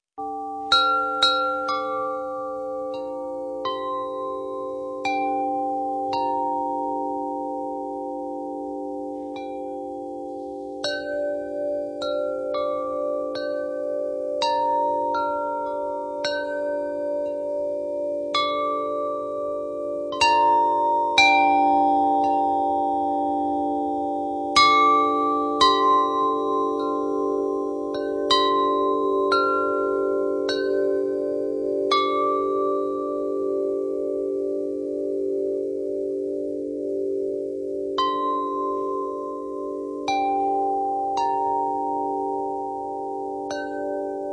Earthsong Chime, windchime

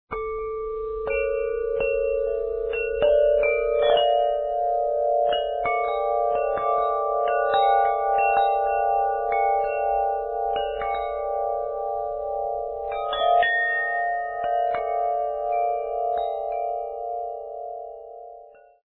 Alto, windchime

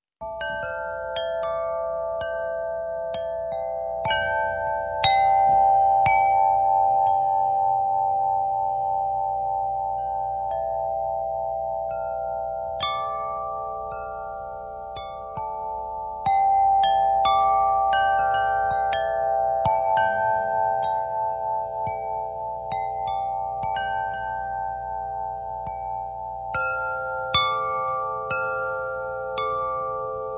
Treasure of Heaven Chime

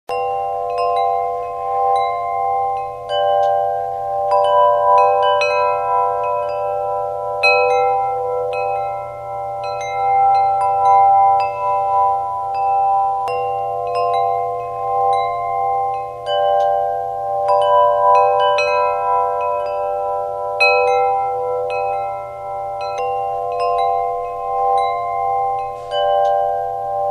Himalayan Echo Windchime

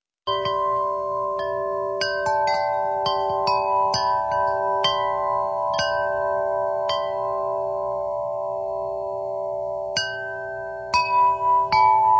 Earthsong Chime, windchime

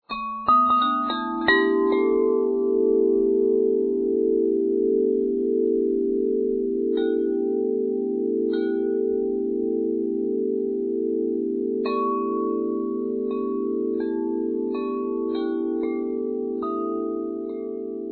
Bass, chime

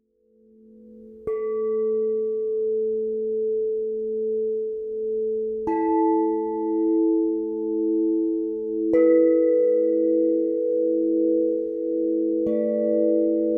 Treasure Of Heaven, chime

